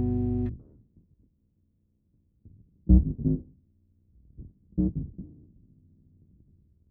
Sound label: oct bass, Recorded